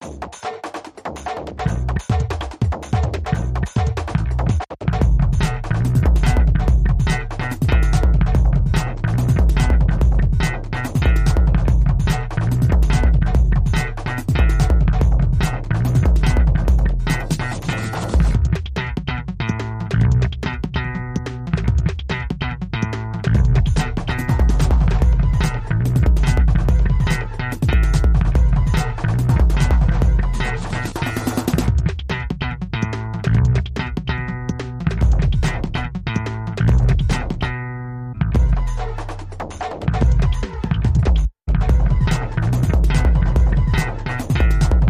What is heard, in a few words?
Slap It Up Underscore